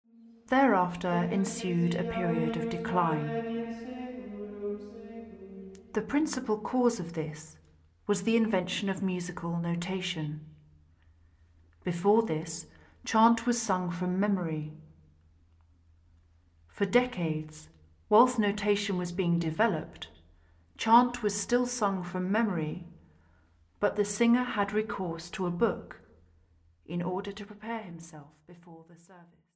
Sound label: Gregorian Chant, Psaume; LearningAboutGregorianChant; Psaume 110